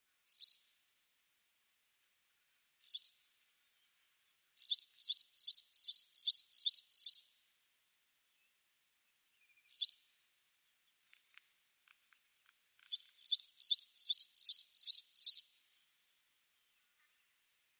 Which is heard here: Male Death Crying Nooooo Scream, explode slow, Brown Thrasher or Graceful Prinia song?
Graceful Prinia song